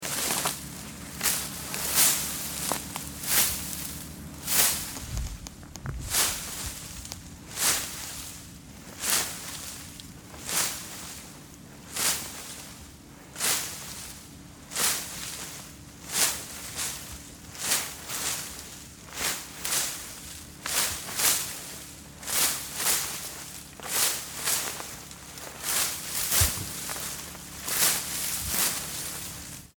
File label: footstep grass